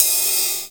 Ride Cymbal

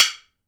woodbloc, wood block; AKAI S-Series CD-ROM Sound Library VOL-5

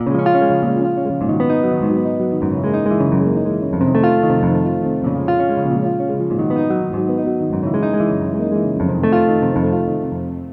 Synth Guitar; Sonic Foundry (Sony Creative Software); Mac Money; Guitars

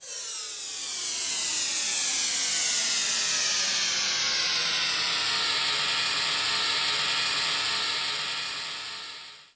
STEAM; Greytsounds VOL-1

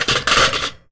JUNK, Anime Sound FX; COMIC

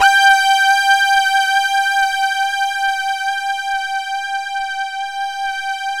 SYNTH PAD; Sound Gallery - Charged; SYNTH PAD 1

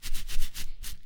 AFRO RUB, Vocal Planet, Blues Soul; Spectrasonics

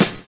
VINYL SD, Drums Dance & Groove

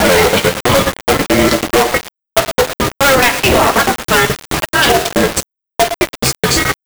Envelope Beat; Drums & Drones

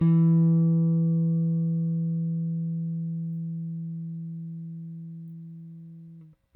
Rick Thumb, Rickenbacker Bass; Airwindows

